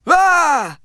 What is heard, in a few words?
Emote Male Scared; gamerip; Idle Champions of the Forgotten Realms